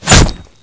hit

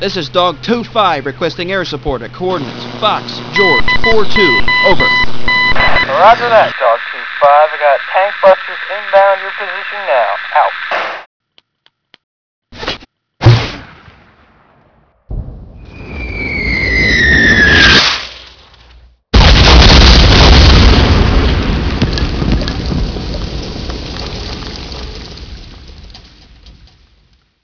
mortar call; zs decoy

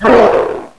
death, npc

